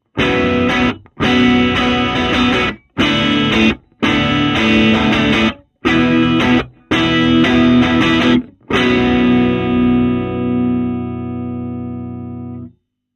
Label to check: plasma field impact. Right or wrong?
Wrong